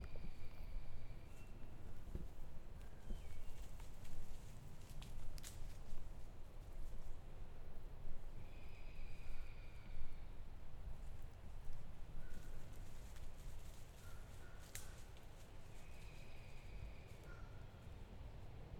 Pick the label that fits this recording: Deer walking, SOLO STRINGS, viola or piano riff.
Deer walking